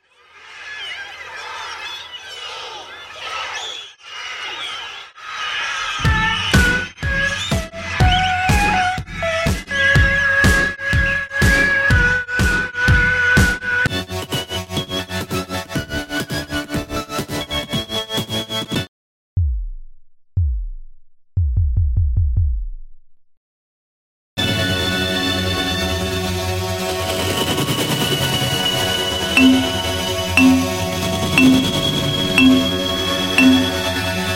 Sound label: crazy warp, csound